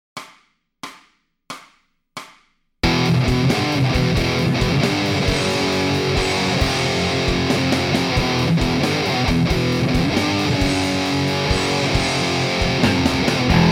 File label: Riffs